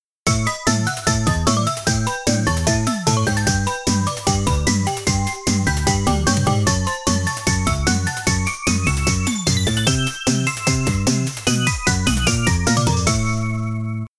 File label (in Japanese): 負け BGM; Face Off; arcade